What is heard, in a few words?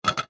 environment object drop ceramic